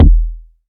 solid steel kick drum sample e key, Royality free bass drum one shot; kick drum samples